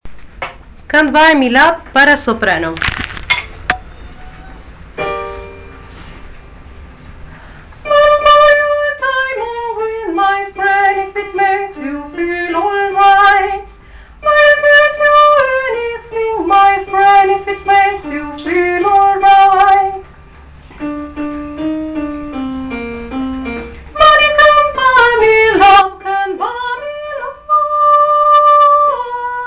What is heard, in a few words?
Sopranos